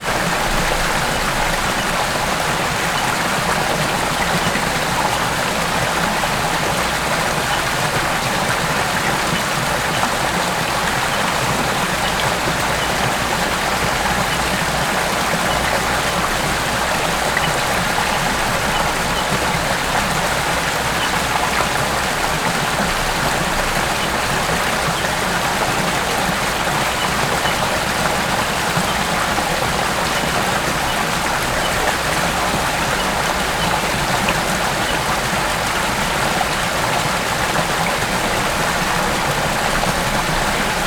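nature creek rocky fast slight distance forest buderim queensland australia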